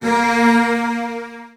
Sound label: A#2, Virtuoso Strings, 16TH; ILIO; Kirk Hunter; CL 16TH